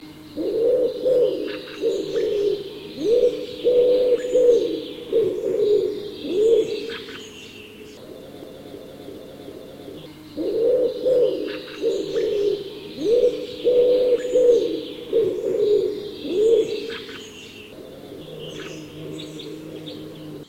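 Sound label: wood pigeon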